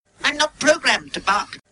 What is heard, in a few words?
no bark